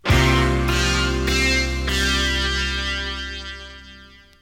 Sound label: topformat maxi jingle single